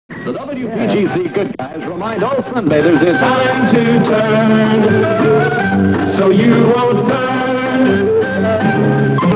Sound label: jingles, turn so you dont burn; 1966